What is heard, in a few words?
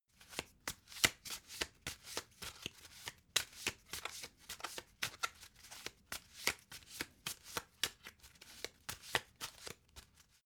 playing cards shuffle slow